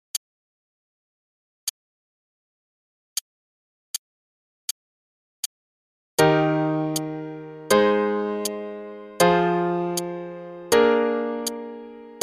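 Eb Major Chord Progression only (quarter note=80), I - IV - ii - V7 - I; CM Level 5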